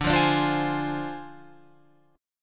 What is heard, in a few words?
D Minor 7th Flat 5th Guitar Open F